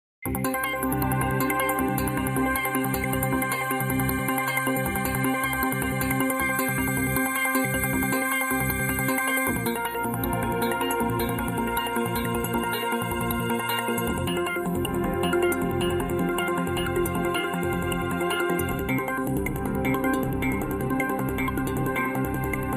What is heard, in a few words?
Rhythm